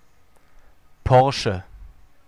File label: porsche